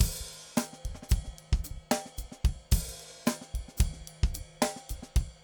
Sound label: funk 5 4 110; odd loops